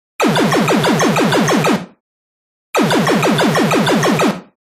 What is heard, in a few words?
Bursts Jittery Notes, beeps & tones